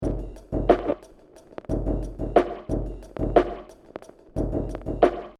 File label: Hip Hop Drum Loop 90 Bpm Straight Lo-Fi; Royalty Free Drum Loops; Next Door